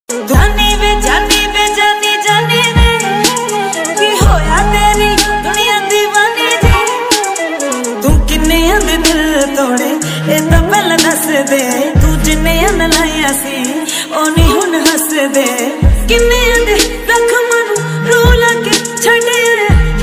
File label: punjabi ringtone